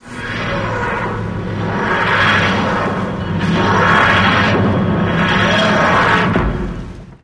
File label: tardis